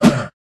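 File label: flying armor dodge; letaushii dospeh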